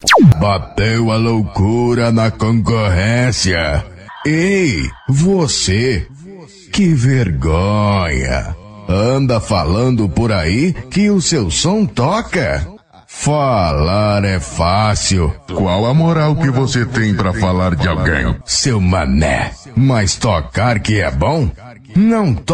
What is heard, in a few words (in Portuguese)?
forro